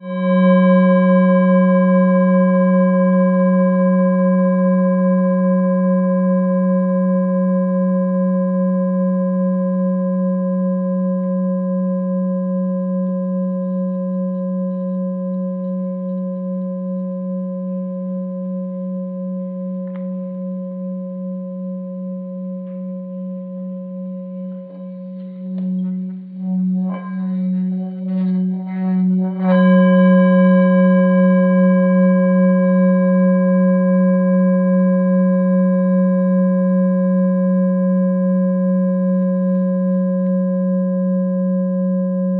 Bol Chantant Tibetain; OM SAJAN

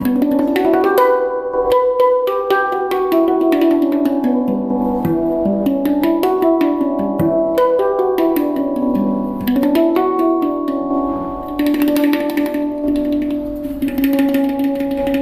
handpan dore 9 notes; monboltibetain

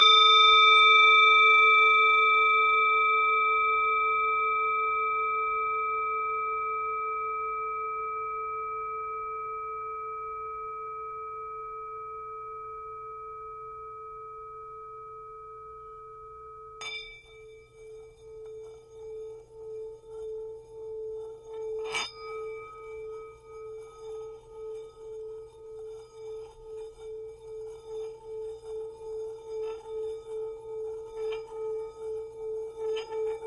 Bol Tibetain Himalaya; Bleu

